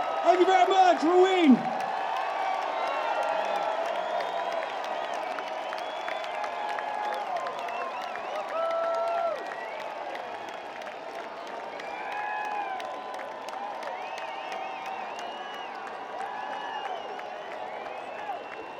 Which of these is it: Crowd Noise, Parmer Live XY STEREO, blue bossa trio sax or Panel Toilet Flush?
Crowd Noise